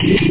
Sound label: shoot; captain custard